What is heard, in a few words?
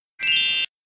reload